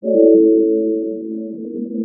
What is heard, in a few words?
oow reconstructed